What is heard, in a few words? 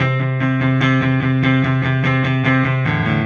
Silos Clean Strum Guitar, Indie Rock